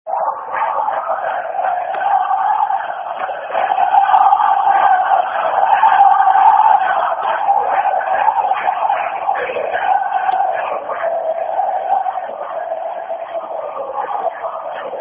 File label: Football Chant (Soccer Song); My Old Man Said Be A Linfield Fan; Linfield Lfc